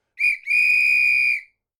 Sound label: Whistle; kyplay; anemoi